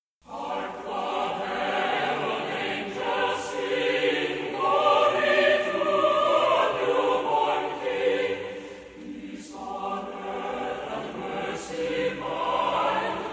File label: Chorale; Hark, The Herald Angels Sing